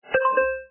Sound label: click